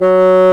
Bassoon

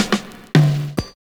FILLS, 70_s Breakbeats

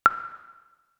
Percs, Elektron MachineDrum; SPS1 MKII

